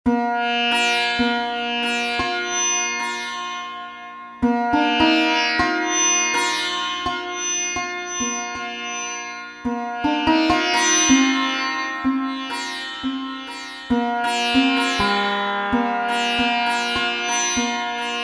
surbahar

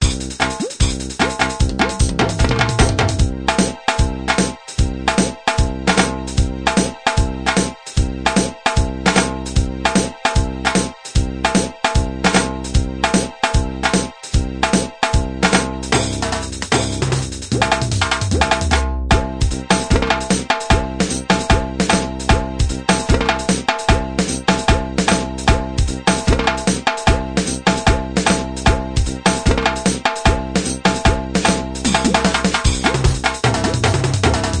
rock curry mix, groove

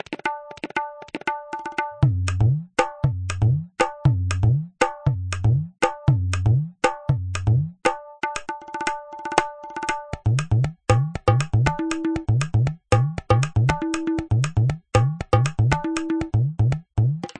KAHERWA, grooves

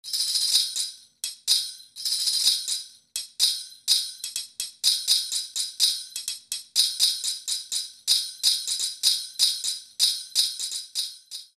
chimta

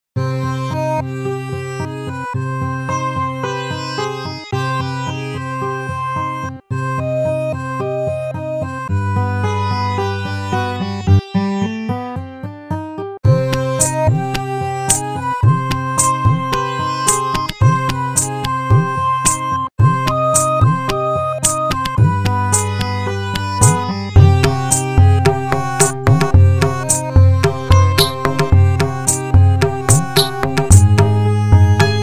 Melody

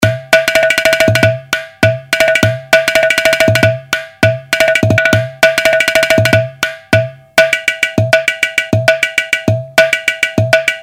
ghatam; clay pot; Swar Systems

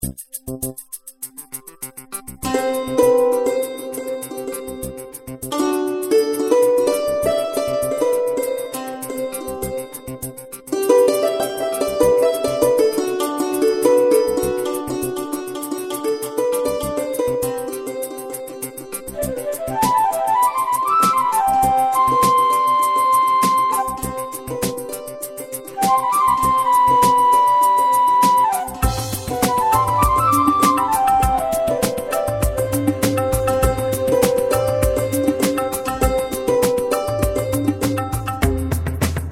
Kashmiri Fusion

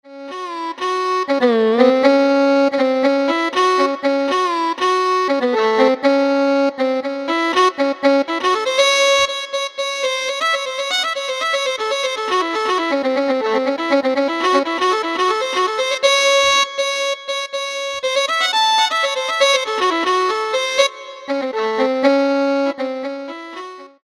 violin

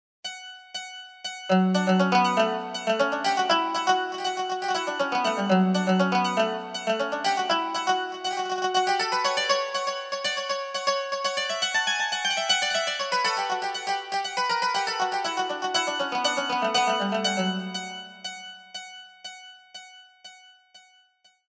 mandolin

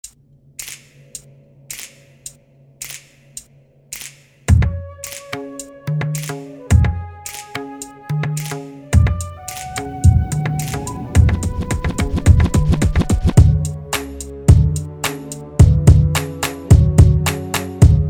Hip Hop Pakhawaj

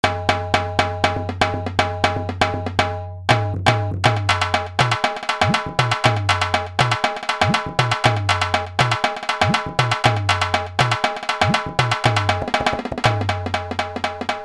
dhol

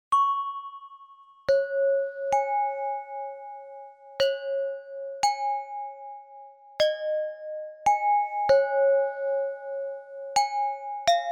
bells

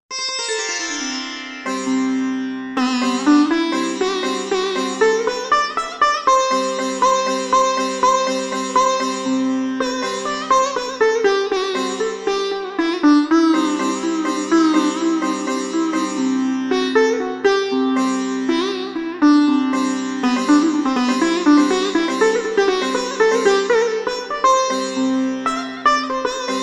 sitar yaman

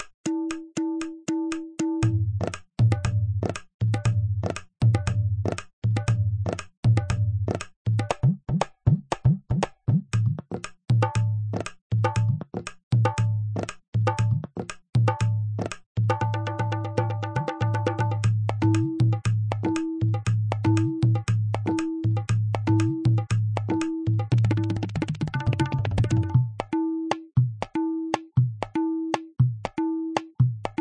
FILMI, grooves

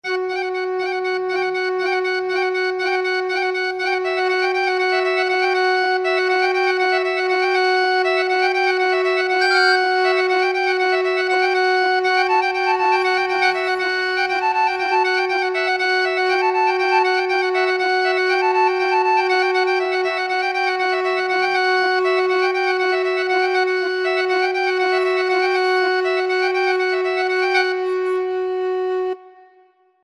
algozay

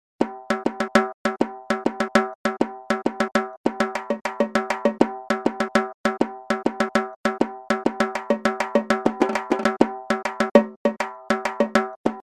halgi

